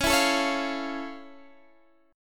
F Augmented Major 7th C sharp

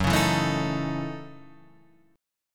F sharp Minor 13th; strummed; JGuitar

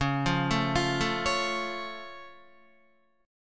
C sharp Augmented down Guitar, chord; arpeggiated; JGuitar

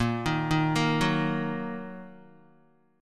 A sharp Suspended 4th Sharp 5th down Guitar; arpeggiated; A#sus4#5; JGuitar

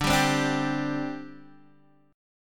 D 7th Suspended 4th, D, down, Guitar Open D

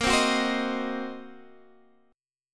C Minor 7th Flat 5th Bb, chord

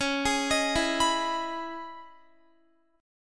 C sharp Minor 9th C sharp Guitar; C#m9; arpeggiated; x,16,20,20,16,19

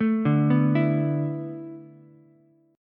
B Minor 11th B down Ukulele C6; Bm11 arpeggiated; JGuitar

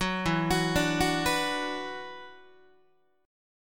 E 7th Suspended 2nd, Guitar; arpeggiated; chord; JGuitar

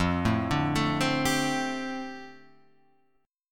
F 6th Add 9th F down, chord; F6add9 arpeggiated

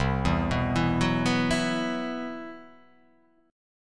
C Major 7th Flat 5th C

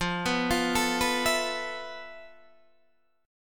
F Major 7th Flat 5th Guitar DADGAD; 15,14,15,14,14,14; down; chord shape

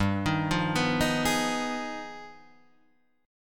G Augmented 7th Flat 9th; Gaug7b9 Chord {3 6 3 4 4 4} arpeggiated

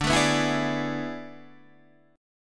A Major 7th Suspended 2nd Suspended 4th

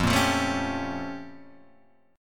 Bb Minor Major 13th

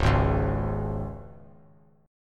Db 5th Db down; Db5 strummed; JGuitar

